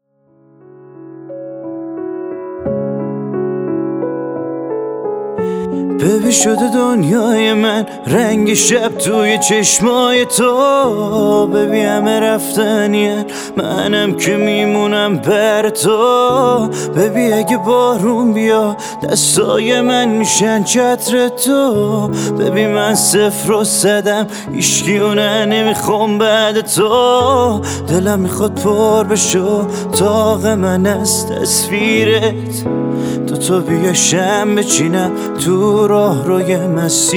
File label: (Piano Version)